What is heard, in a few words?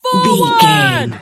voice female a battle shout forward